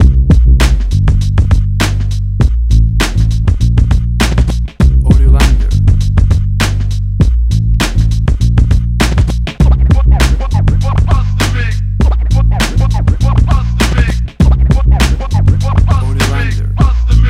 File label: 90s Hip Hop; Pico Boulevard